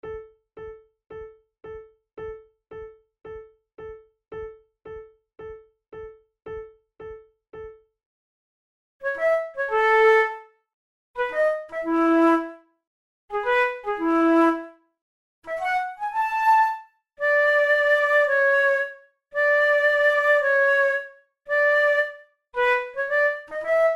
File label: methode de flute; tulou